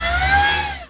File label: scissor lift down; Tony Hawk's Project 8; XBOX